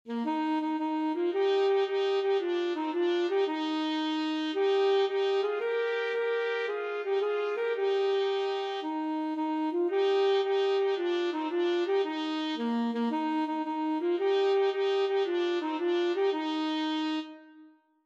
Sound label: itsy bitsy ASAX TPT, trumpet-saxophone duet